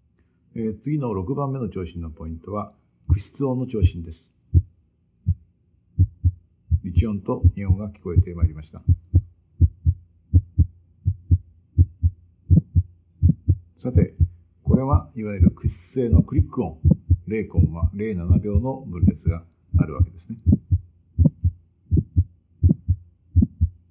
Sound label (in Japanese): 駆出音